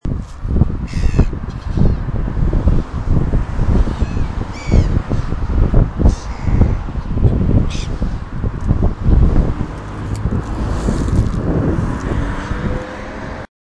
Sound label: gulls, Poole